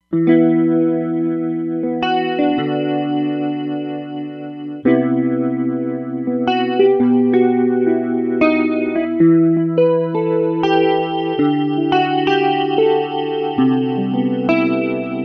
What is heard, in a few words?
Roland Fantom XR